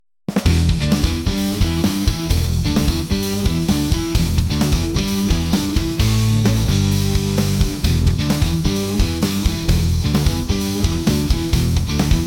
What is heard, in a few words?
Podcast Rock